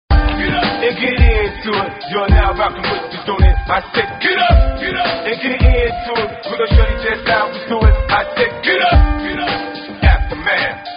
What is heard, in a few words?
funk; multitrax